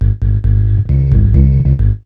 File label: B LOOP; E-Lab; X Static Goldmine 1